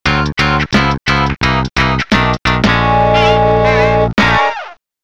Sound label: Funky Mission Fail; Donkey Kong Country 2: GBA